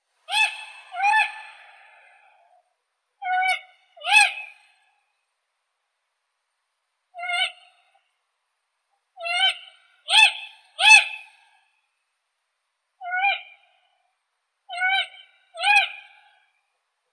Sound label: sichik vorobinii glaucidium passerinum